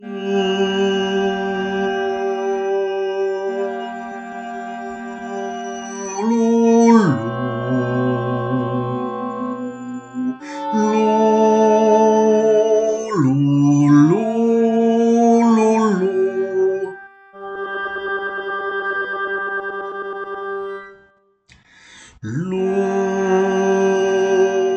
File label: Les 4 Saisons Ete Soprano; Soprani